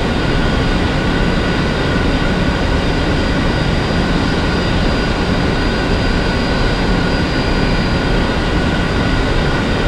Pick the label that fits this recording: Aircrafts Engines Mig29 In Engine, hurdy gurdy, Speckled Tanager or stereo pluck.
Aircrafts Engines Mig29 In Engine